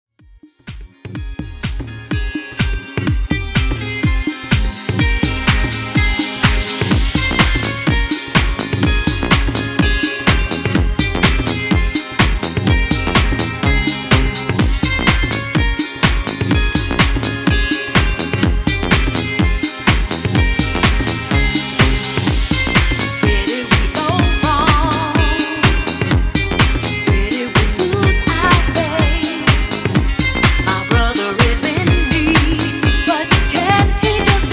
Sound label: Dance House, 125 Bpm